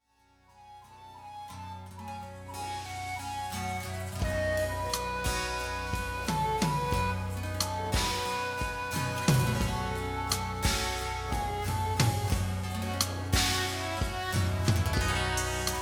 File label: Drums